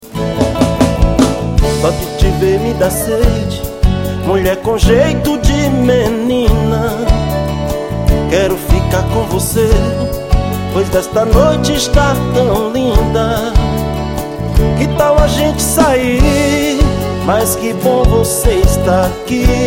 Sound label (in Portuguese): sertanejo ao vivo